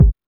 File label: Techno E; EMU XL-7